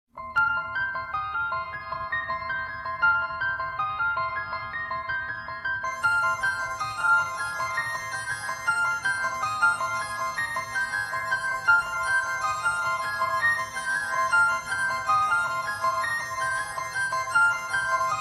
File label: exorcist